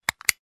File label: Move